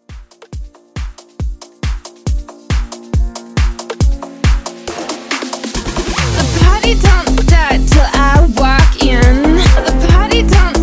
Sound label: Halloween Mixshow 138 CLEAN